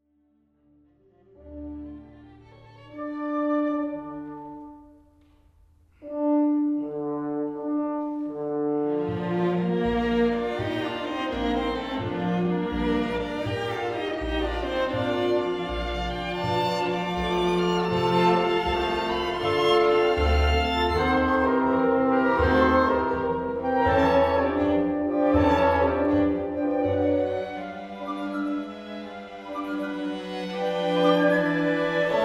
Scherzo. Allegro non troppo

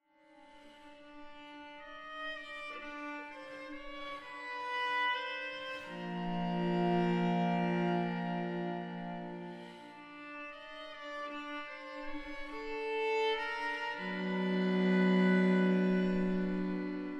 Viola Theme

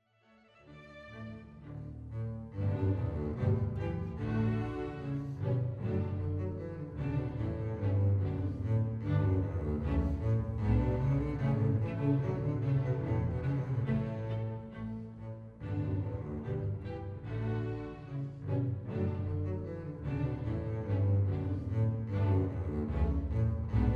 Haydn 72 Bass Solo

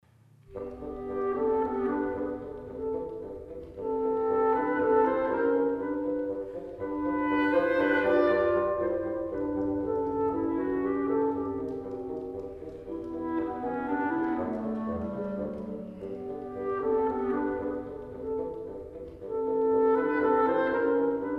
Menuetto

